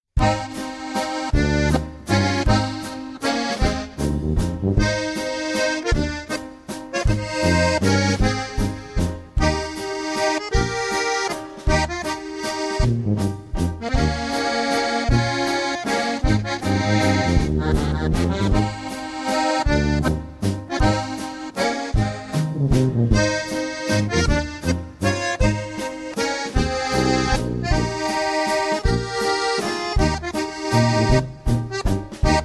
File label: Waltz